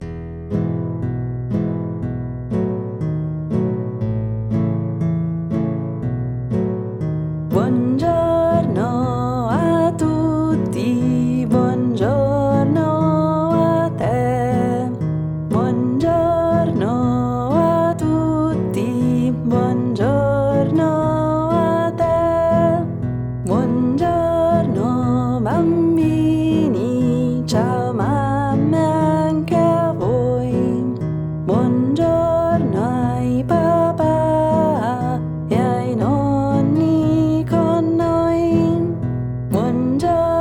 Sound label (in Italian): Buongiorno a Tutti